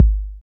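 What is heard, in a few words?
bass drum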